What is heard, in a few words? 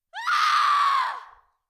Woman Scream